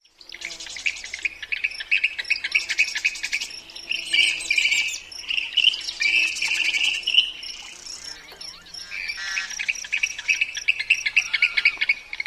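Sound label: mechanical sounds, Western Grebe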